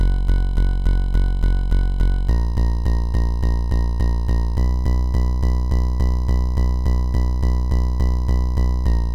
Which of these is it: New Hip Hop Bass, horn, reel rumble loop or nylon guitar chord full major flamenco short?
New Hip Hop Bass